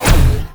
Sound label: rocket launch; cdogs